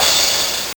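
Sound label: Game Boy Perc, DRUMS; ChipShop Deluxe; ChipShop Hits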